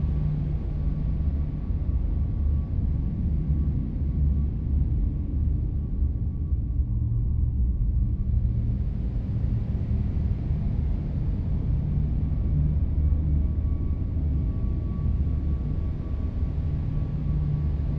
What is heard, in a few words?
habitat idle; aquatics